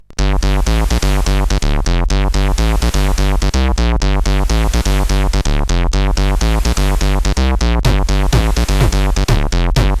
Quite Acid, sid CSG8580R5

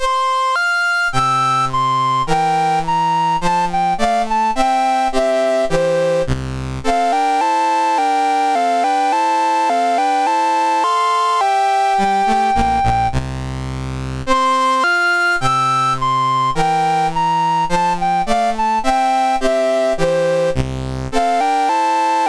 Canzone di Doretta, MOS6581R3

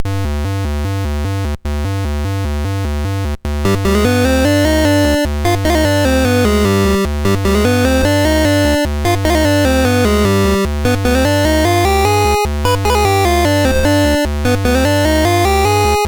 Dragonworld sid MOS6581R4

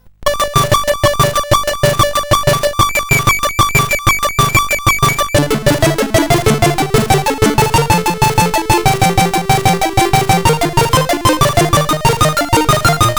Intro, SID CSG8580R5

